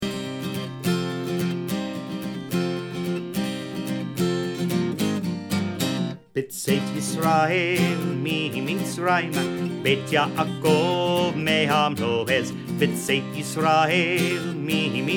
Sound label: Psalm 114 with Guitar; passover